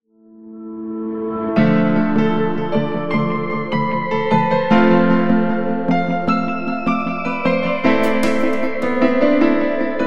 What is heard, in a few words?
Dynamic Piano, corporate music; corporate music vol 5